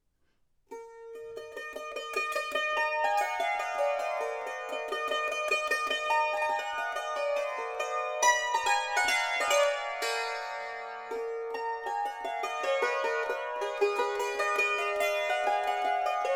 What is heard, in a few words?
finger pizz